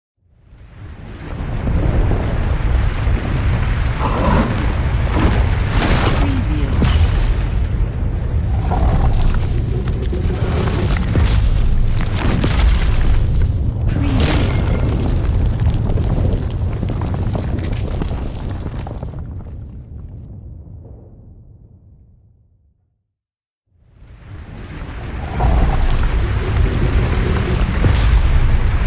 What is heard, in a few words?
Destruction Earthquake Sound Effects